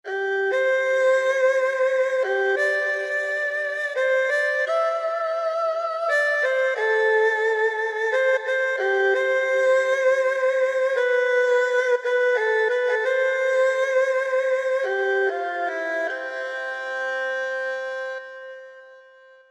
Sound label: China Flute